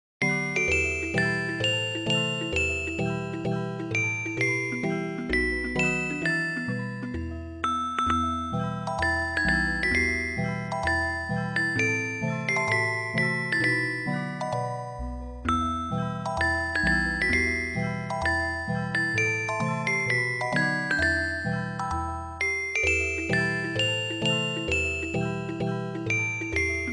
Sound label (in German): orgel